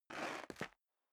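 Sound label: step dress boots concrete grit scuff, footsteps; schritte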